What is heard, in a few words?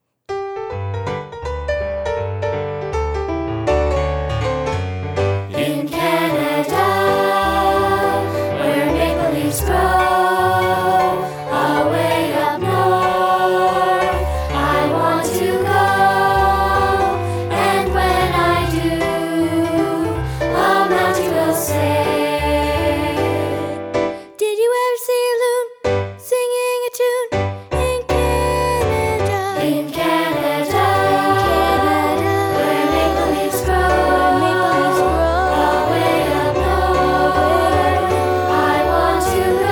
In Canada vocals